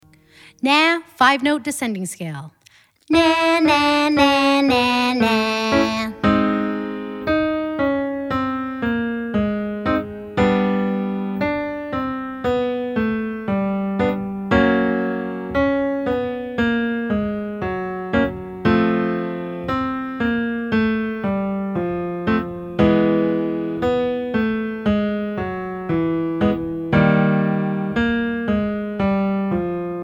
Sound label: nah 5 note descend high range, vocal exercises